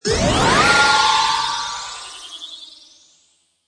Magical Ascend Metallic Flutter, Magic sound of casting spell; Magic sound of casting spell, conjuring or wizardry. Witchcraft ritual summoning elementa